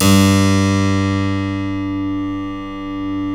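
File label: G1 clavinet left, Keyboards Acoustic; USB Soundscan vol.17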